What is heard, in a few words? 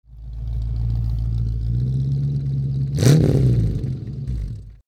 vette blip